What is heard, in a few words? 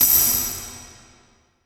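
PERC PLATE TAMB, reverb; SPX900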